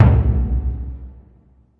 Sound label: big burundi, African Burundi Drums; ETHNIC and WORLD PERCUSSION